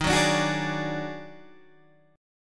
D sharp Minor Major 7th Double Flat 5th D sharp Guitar Standard; strummed